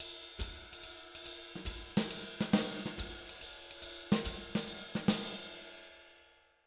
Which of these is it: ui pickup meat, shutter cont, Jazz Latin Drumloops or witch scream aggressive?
Jazz Latin Drumloops